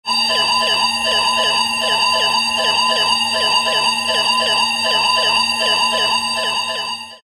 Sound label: SCI-FI ALARM